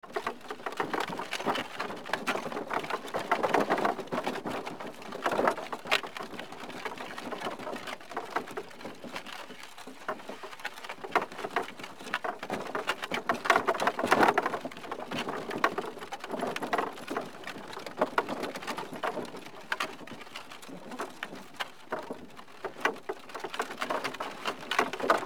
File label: stage coach